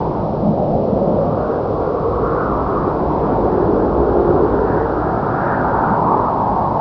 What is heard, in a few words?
wind; quake2; chaos